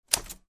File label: Smith Corona 1940 vintage typewriter single letter